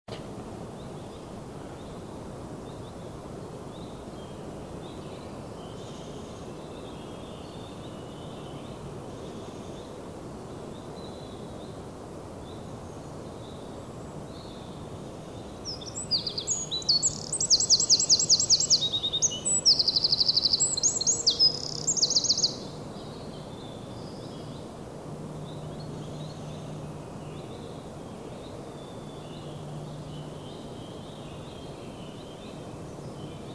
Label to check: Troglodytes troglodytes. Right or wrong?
Right